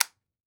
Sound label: Weapon Gun Rifle Military M1 Carbine Safety Off; AdobeCC